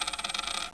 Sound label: collide